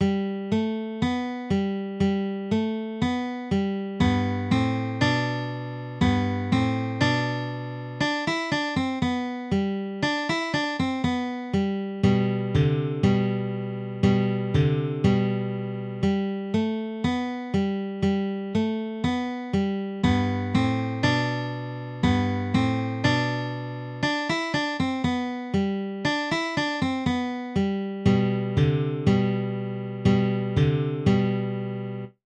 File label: frere jacques guitar